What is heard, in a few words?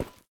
Footstep Solar Panel